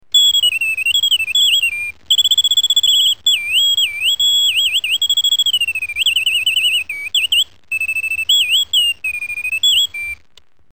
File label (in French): oiseau reveil